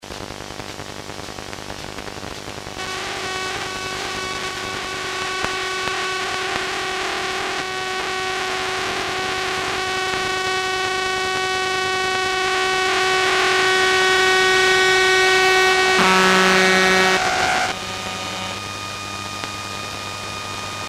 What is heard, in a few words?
subway arrival